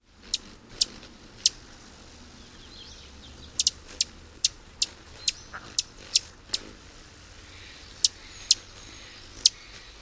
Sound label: aggressive chip calls